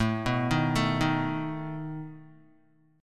A Suspended 2nd Flat 5th A down Guitar; Asus2b5 arpeggiated

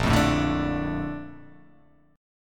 Bb Major 7th, BbM7 chord; x,1,3,2,2,4,4,x; strummed

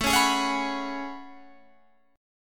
A Major 13th, down Guitar Standard; AM13; strummed; JGuitar

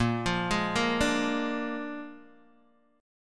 Bb 7th Bb down Guitar DADGAD

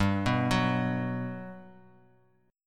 G Major 7th, G, down; arpeggiated; JGuitar

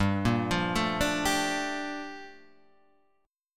G Minor 9th

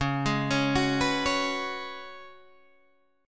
C sharp 7th Suspended 4th C sharp, Guitar Open D

